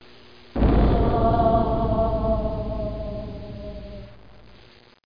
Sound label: Spooky; Broderbund Print Shop Multimedia Organizer Deluxe Edition